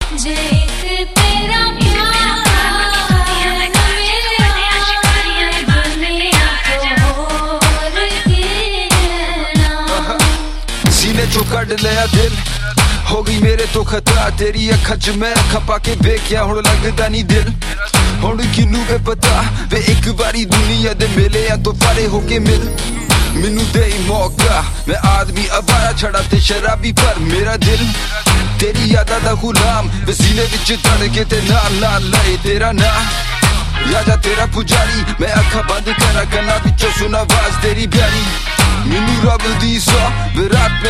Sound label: Punjabi Remix Tones